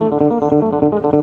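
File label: electric guitar melody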